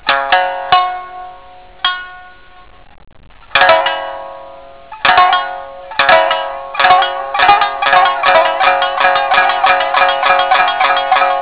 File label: shamisen; Japan